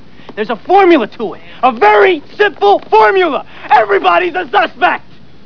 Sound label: Scream